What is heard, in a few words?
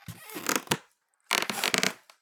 wooden floor squeak; anemoi